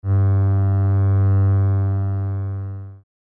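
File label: cello D sharp 2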